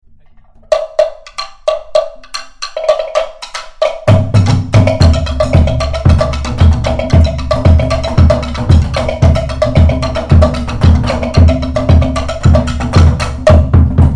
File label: beat; polymusic